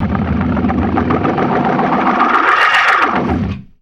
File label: GLISS, ORGAN RIFFS; InVision Interactive; Keith Emerson